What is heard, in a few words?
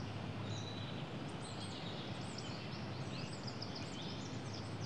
birds, ambient animal; Counter Strike Model Pack; CS:GO